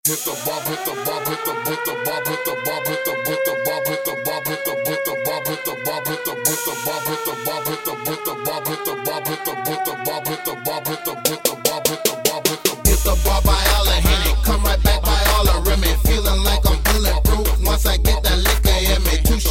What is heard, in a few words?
Dope Beatz